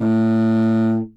contra bassoon; Notes; Winds

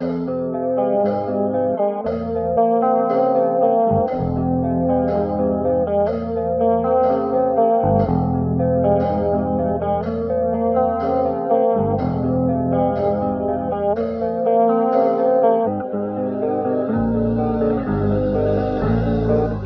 guitar, Jazzmatazz loops

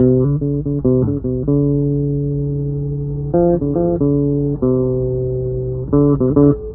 bass, Loops Phonky

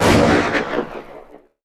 gun shot; Crash Bang Boom

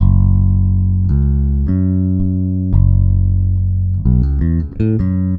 bass

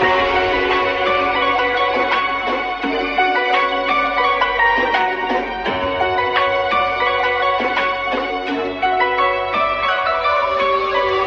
keys hindu chant, Phonky loops

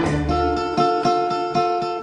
guitar

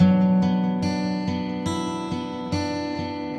guitar, Sad

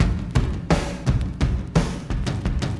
sizzlin, Drum loops

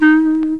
clarinet; Winds

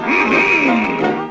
mmm hmm, voice

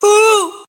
"ooh" SUNG

